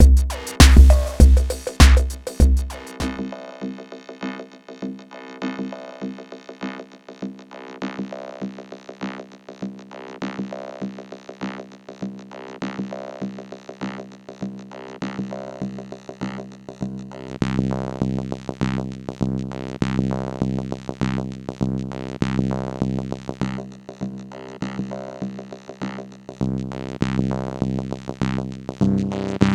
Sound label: vocoder drums filter quality